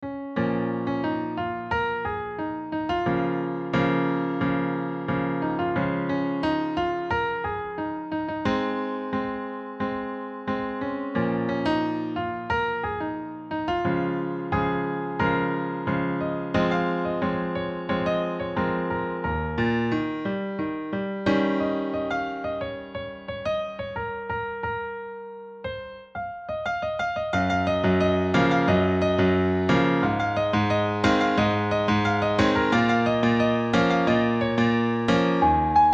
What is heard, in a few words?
solo, piano